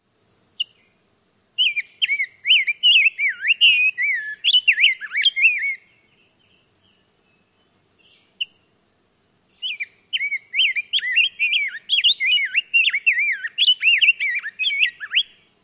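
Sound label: chink calls and songs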